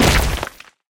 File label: ledge fall; Golden Crayon Sounds